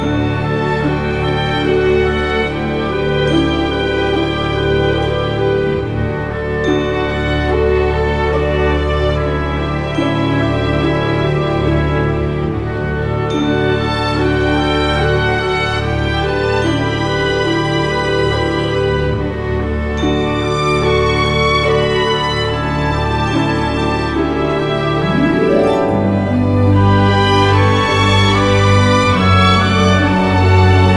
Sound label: Peace Theme Loop